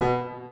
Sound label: piano note